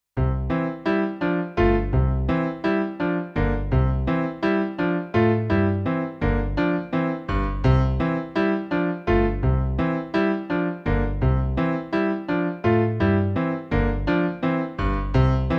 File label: backing